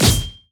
riposte; LoL; fiora

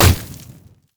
axe throw land; olaf

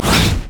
resonating strike; LoL; leesin; blindmonk

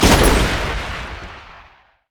cluster shot; LoL; graves

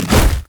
basicattack; maokai

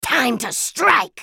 attack; Kennen; Character Voices - English

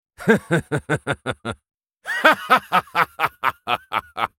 laugh; Draven

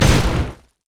steam golem basic attack; LoL; blitzcrank

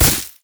nashor attack oh; LoL; monsters

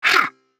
effort; BadRobotAmumu

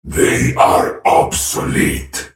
attack; Viktor; Character Voices - English; en US

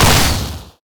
explosive shot; rocket tristana

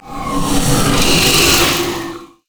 soul shackle loop; morgana; fallenangel

